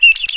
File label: icewind damage